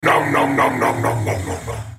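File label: ultimate effort; BattleCastChogath; Character Voices - English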